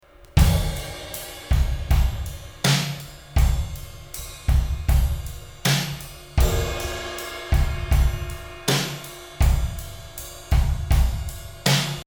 drums room, a realistic 0.7 second room sound to our drum track; Listen to how we've put the drums into its own space now.